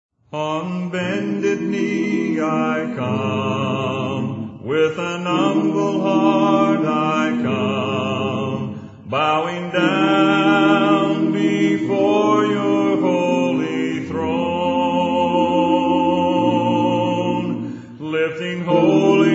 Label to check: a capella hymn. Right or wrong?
Right